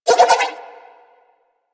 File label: Nunchaku Prime Spin Whoosh; Warframe; Tenno